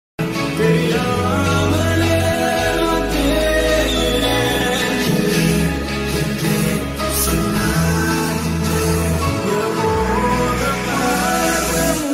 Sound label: slowed ringtone, tamil ringtones